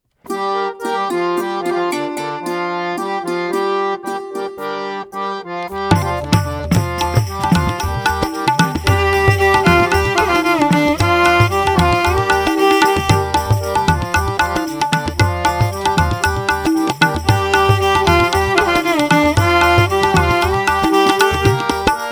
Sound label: Gents Karaoke G Pancham